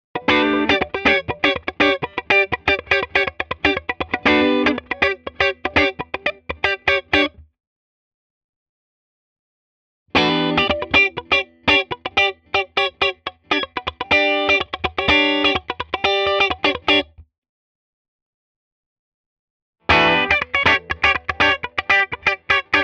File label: L7V TS Clean Funk